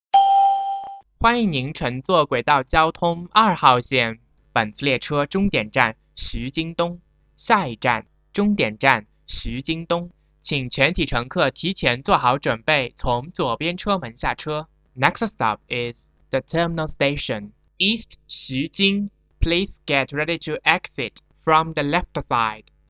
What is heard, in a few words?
east xujing station, subway